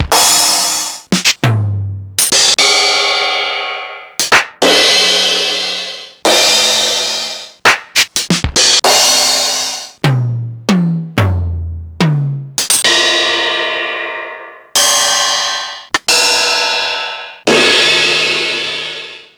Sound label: Ensoniq Mirage, Samples Sliced; m8